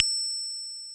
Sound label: PERC WAVE, drums; Big Fish Audio; Synth City